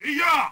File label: punch